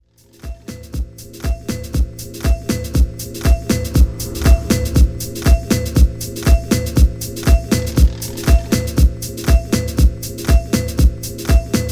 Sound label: Ride; Harrison Crump